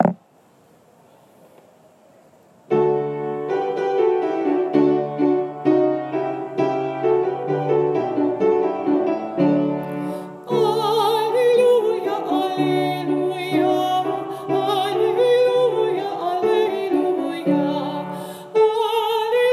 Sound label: alleluia soprano, choir practice tracks; holy week choir practice tracks; Easter; Choral Recording